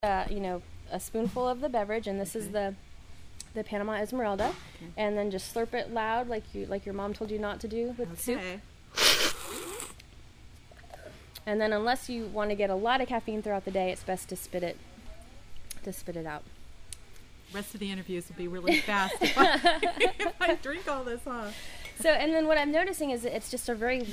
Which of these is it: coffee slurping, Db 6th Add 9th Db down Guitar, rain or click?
coffee slurping